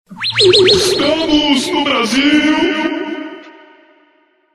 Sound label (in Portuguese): vinheta